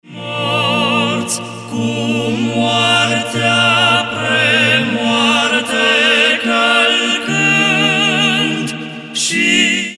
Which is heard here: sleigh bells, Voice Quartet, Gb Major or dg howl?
Voice Quartet